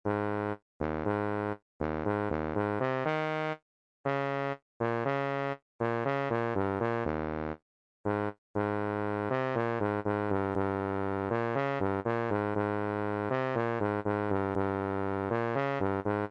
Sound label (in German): Eine kleine Nachtmusik Tuba-Quartett Bass 3 Bb, Tuba Sousaphon